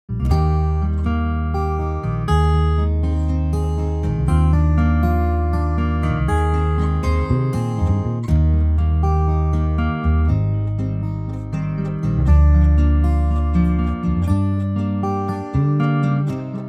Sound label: acoustic Eb; key - Eb - vocal range - C to Eb (optional Gb)